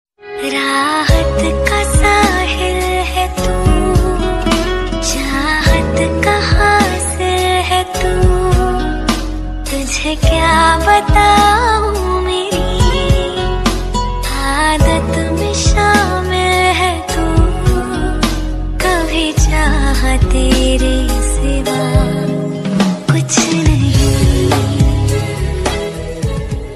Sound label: old hindi ringtone